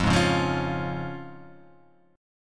F Minor Sharp 5th, F

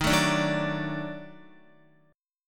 Eb Minor Major 7th Flat 5th Eb down Guitar; EbmM7b5; strummed; JGuitar

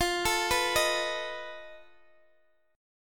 F 7th Flat 5th F down Guitar Open D

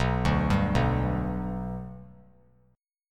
B Minor Sharp 5th; arpeggiated; JGuitar

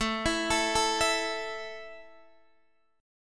A 5th A down Guitar Open D

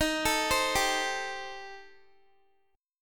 Eb 6th Flat 5th Eb down Guitar; arpeggiated

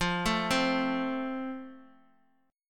F Major, F Chord; arpeggiated

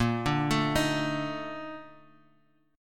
A sharp Major 11th A sharp Guitar Half Step Down; A#M11

